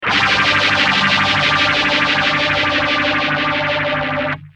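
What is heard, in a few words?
guitar, Intelliphase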